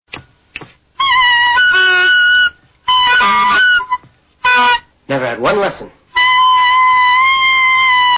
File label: clarinet